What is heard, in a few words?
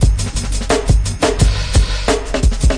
173 deep turnaround, DRUM LOOPS and BREAKS